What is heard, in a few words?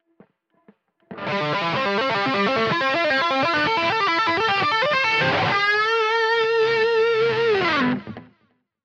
Tapping